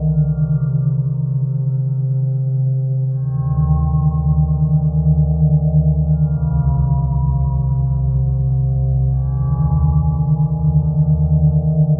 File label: SPHERE, D+B, 160, C; eJay